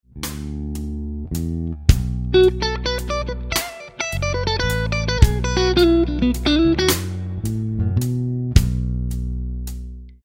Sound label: Lick, guitar; Slow Minor Lick 15; Minor Blues licks